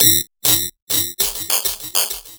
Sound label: Psychic Beat; Sonic Foundry (Sony Creative Software); Loop Noir; Session 08 - Psychic Investigator